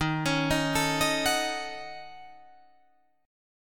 D sharp Minor 7th Flat 5th D sharp down Guitar Drop D